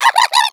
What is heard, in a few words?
egg bot hot laser; Portal 2